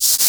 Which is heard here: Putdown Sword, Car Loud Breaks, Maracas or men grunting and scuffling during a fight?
Maracas